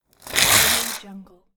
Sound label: page ripped from binder; electronplanet